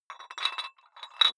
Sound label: dumbbell put on rattling weight hit, fitnessstudio